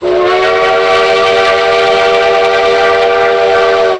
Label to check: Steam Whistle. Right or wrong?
Right